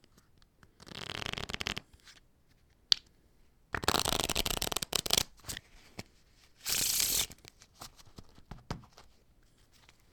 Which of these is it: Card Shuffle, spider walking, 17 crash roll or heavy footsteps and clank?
Card Shuffle